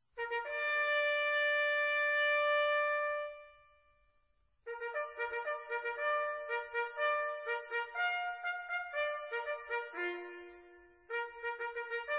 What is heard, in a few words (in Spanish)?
orden general, corneta